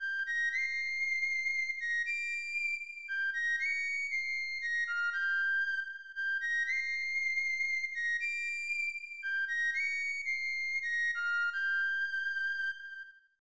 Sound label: Whistle (Long); Mega Man Soccer; SNES